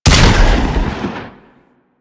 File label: Double Barrel Shotgun Fire; Weapons